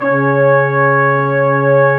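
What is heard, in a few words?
BRS Quintet, brass quintet; Roland L-CDX-03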